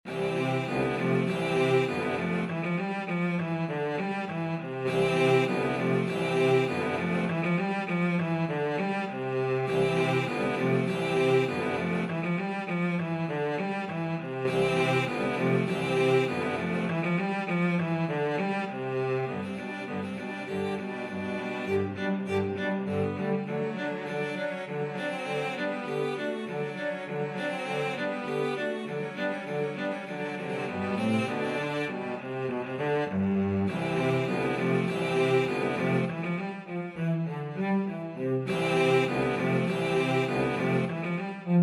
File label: cello quartet